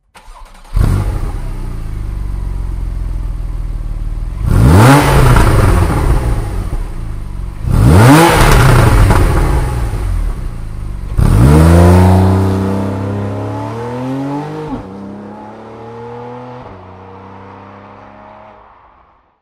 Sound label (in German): 911 carrera s motor starten; 911 991